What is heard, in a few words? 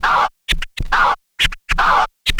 Scratch